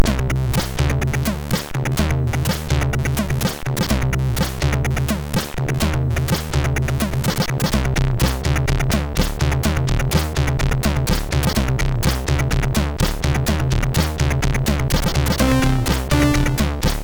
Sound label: sid MOS6581R3; James B Cool